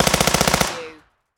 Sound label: Submachine Gun HK MP5